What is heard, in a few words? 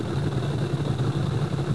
prop